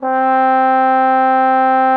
TROMBONE; Xsample VOL-3

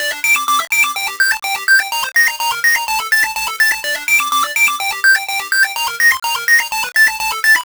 D 125, Synth Loops 125bpm; Zero-G; Soundclash Nu Dance Electro; Acidized

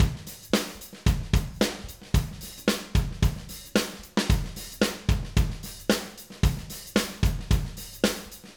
Acoustic Live Drum; Platinum Audiolab

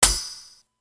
hit, brass

